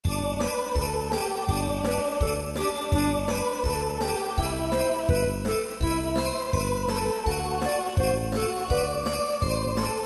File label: jingle bells; town